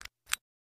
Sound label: Push Button Soft, buttons